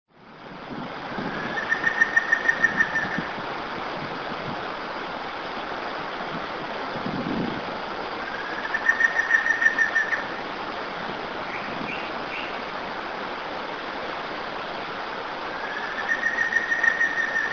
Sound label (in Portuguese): Batara cinerea Matracão